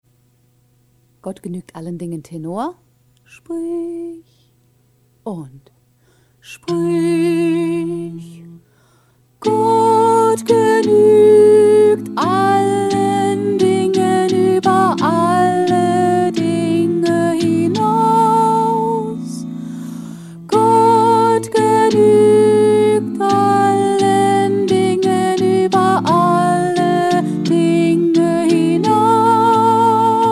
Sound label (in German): tenor